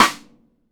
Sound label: METAL, RIM SHOT